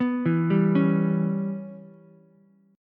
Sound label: E Minor 6th E down Ukulele; Em6 arpeggiated; JGuitar